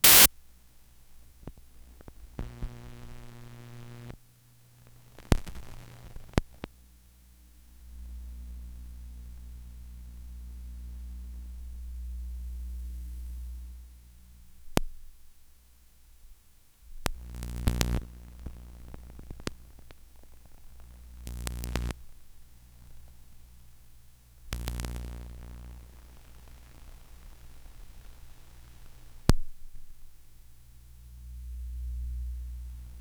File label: low level broadband hiss, noise